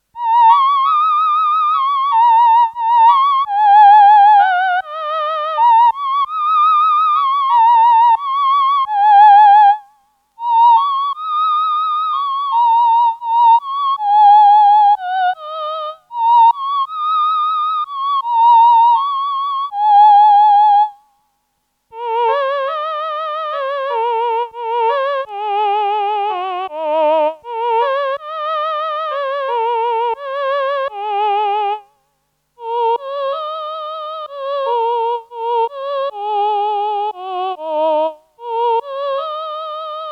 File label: VP330 VC340 Male4 Female4 Vibrato